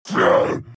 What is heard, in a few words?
Monster Grunt; The Binding of Isaac